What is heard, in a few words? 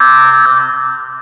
Alarm